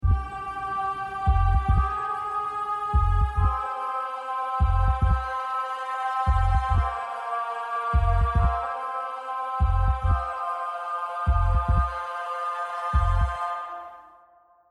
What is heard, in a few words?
Jingle; Saison 2